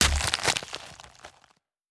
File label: Death Bug Brutal